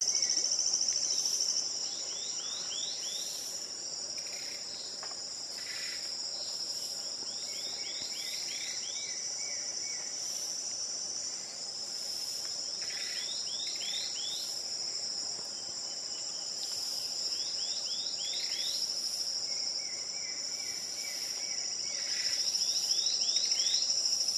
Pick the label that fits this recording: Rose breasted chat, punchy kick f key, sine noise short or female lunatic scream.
Rose breasted chat